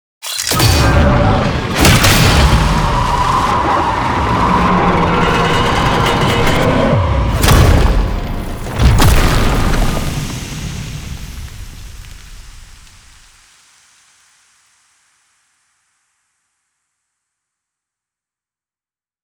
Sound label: Funeral Cin SFX; Warframe; Tau; Cinematics